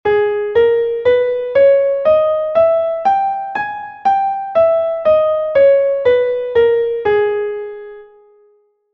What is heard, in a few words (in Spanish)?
sol sostenido menor armonica